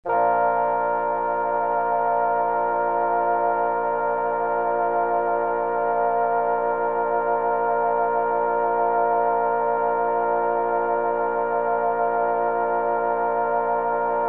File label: bb major chord